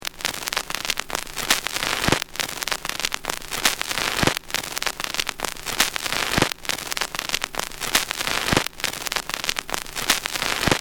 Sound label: Stuck Record, Looped recording of a stuck needle on a record; Miscellaneous Electronic